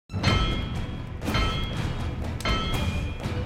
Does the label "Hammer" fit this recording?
Yes